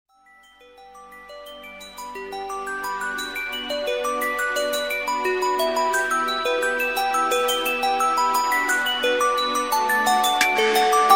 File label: Cute bells